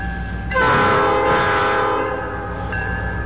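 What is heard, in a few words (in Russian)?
гудок поезда, проезжающего по мосту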